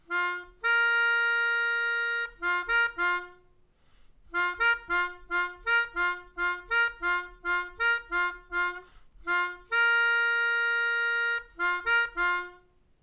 Melodica

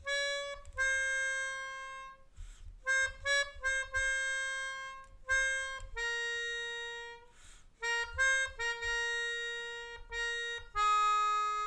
Melodica

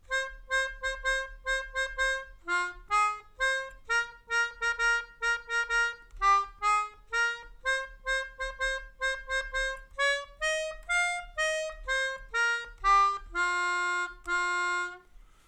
Drunken Sailor, Melodica

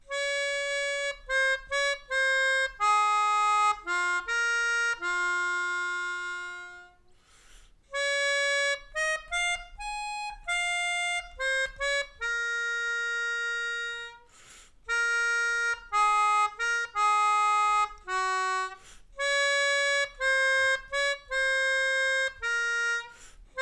Melodica

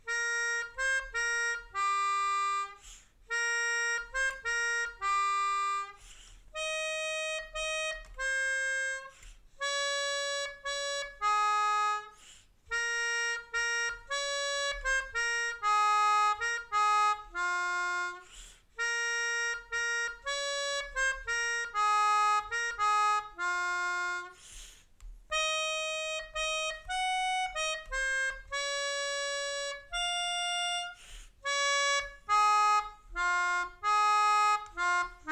Silent Night, Melodica

